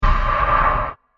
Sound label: Scratch Stab With Reverb; Impacts; Blasts